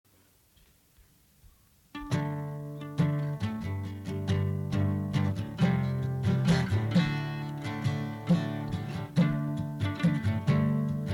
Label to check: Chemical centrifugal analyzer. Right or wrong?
Wrong